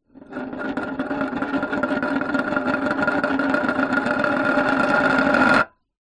foley tin small metal empty down spin wood surface; zapsplat